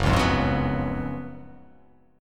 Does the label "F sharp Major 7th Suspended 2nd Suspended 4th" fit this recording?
Yes